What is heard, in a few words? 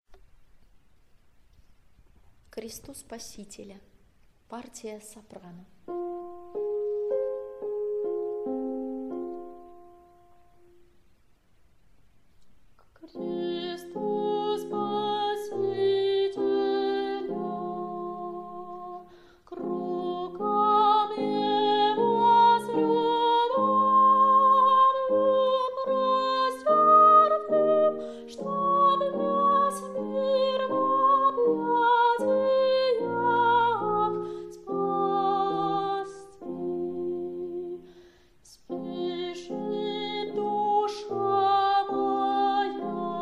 soprano; K krestu Spasitelja